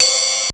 RZ1 ride; Crypton - DrumBase5000

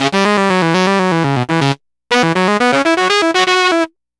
Sax Line; Synthetic Winds; Dr. Fink's Funk Factory

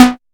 percussion, drum machines, FutureRetro FR777

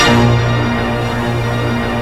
HIT, HIPHOP; Rubber Chicken Software Sample Bank

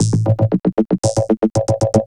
Processed Perc; Drums & Percussion; Processed Percussive; Sonic Foundry (Sony Creative Software); Electrocution; Beat Components

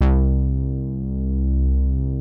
Prophet 5 C#3, Synthesizer; Hollywood Edge - Giorgio Moroder Rare Synthesizer Collection

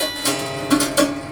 Prepared Piano; Battery 3 Sample Kits

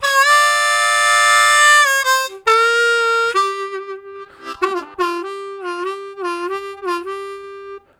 Hard Start, Harmonica; Zero G

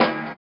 SWUSH; Spectrasonics; Metamorphosis

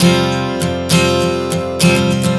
Strum 100 F9, One Chord Strumming 100 bpm; Acoustic Guitar

